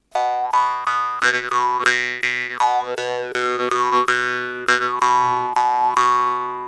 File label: Jews Harp